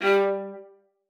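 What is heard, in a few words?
G5, viola spiccato; Xsample VOL-5; 90 s Sample CDs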